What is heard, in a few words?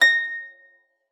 A6, harp forte; Xsample VOL-8